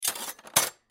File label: Kitchen Drawer, Drawer Put Silverware Away; Household; Kitchen Drawer Put Silverware Single Piece Away Scrape Clank